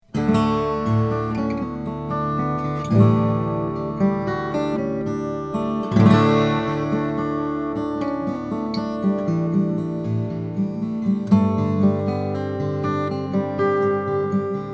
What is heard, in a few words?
olson sj cutaway indian rosewood